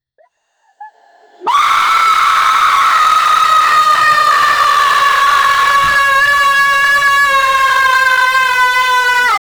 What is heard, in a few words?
creepy crying